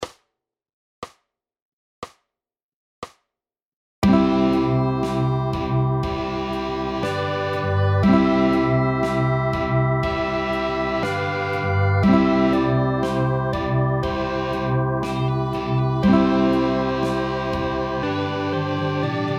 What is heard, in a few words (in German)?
first song gitarre